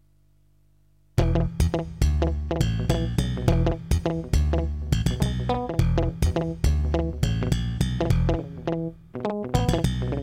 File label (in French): Funk